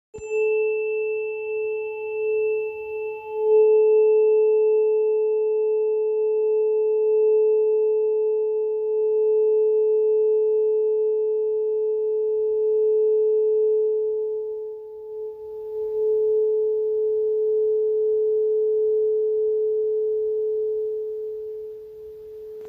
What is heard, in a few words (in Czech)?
432 hz, terapeuticka ladicka 432 hz hlinik